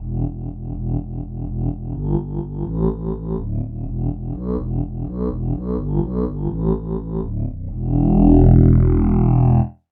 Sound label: accordian bass